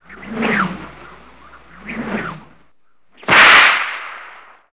Fight; 特酷声音素材库

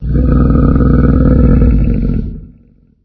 Bear; 暴力赛车

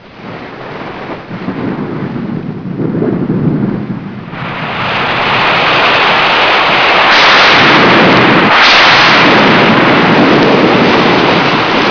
Rain, 雨声; 自然; 特酷声音素材库

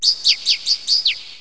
Bird; 特酷声音素材库

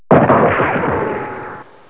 SHOOT, 射击; 战争; 特酷声音素材库

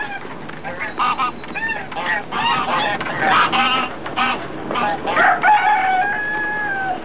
GANDER, 鹅; 动物; 家禽; 特酷声音素材库

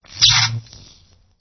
Electricity; 暴力赛车

